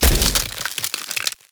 frost freeze

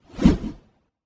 axe 2 hand bound swing

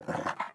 wolf breathe run

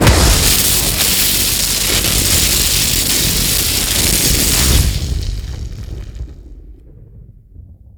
lightning conc dual

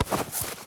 cloth up

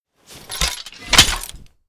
blunt 2 hand draw

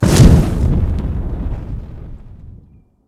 shout fire breath

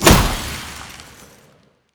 frost icy spear stereo

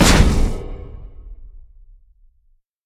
alteration release; skyrim

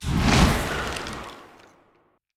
enchantment frost

